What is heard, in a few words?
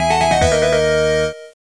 SFX Game Over